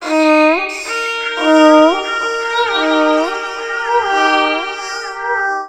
VIOLIN FX; USB Soundscan vol.60; Asian Traditions; AKAI; VIOLON FX

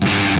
guitar stab

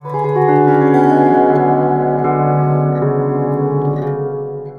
surmandal, stringed instruments; Sonic Foundry (Sony Creative Software); World Pop

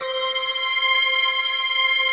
CYMME C5

